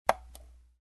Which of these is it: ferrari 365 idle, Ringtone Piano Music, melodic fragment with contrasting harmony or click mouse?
click mouse